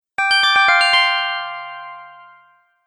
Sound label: Approval, beep